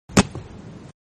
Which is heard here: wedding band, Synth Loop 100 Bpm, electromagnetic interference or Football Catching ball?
Football Catching ball